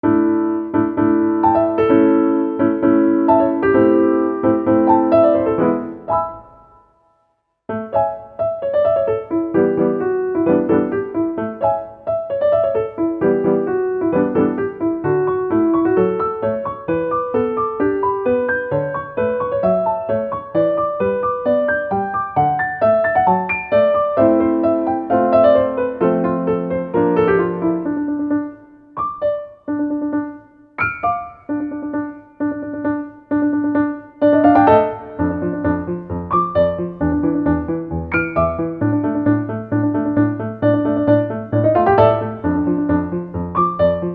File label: piano; 軽やか お洒落